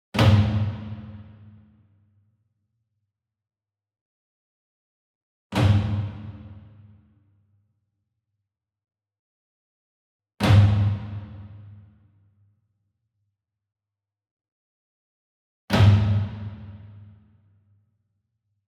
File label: SINGLE DRUM Kodo Flam Hard Beater Far, Cinematic Strikes; boom library; Cinematic Strikes Construction Kit